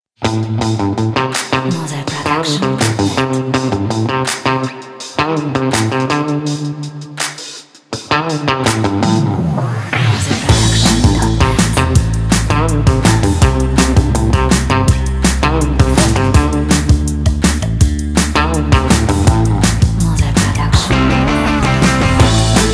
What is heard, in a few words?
Playful and Upbeat Indie Rock